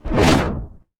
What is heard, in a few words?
cinematic impact wipe